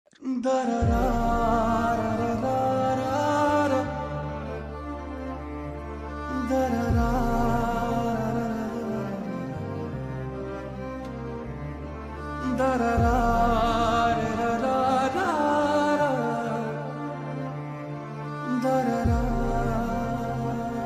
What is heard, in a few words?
jailer emotional bgm ringtone